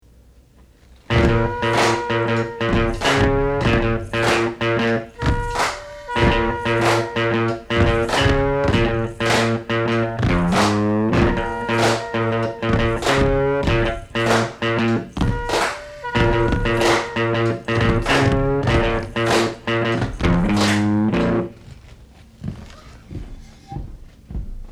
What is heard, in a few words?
boom KraK